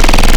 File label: Chainsaw, Wavetables; Cymatics